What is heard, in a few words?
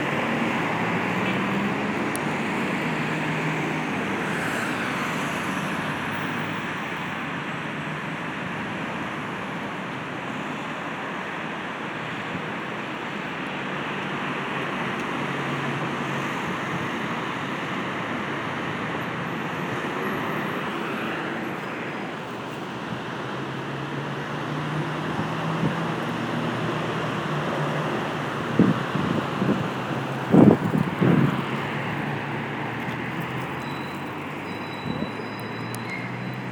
intersection; Curating Aural Experience: A Sonic Ethnography of Everyday Media Practices